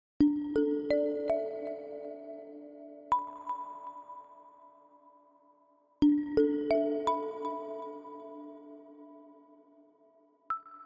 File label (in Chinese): 背景音乐